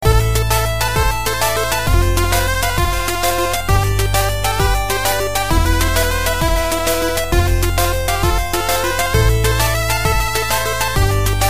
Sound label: Dewdrop Chiptune